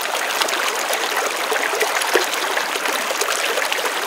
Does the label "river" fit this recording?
Yes